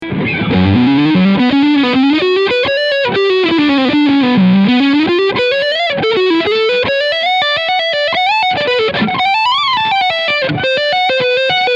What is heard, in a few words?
Lead Front EMG